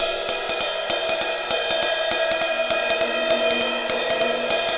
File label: Ride Cymbal Pattern